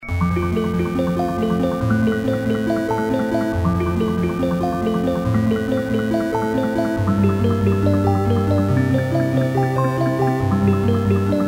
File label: classic music box